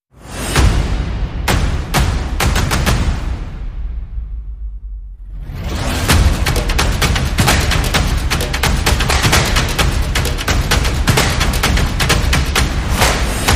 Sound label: No Vocals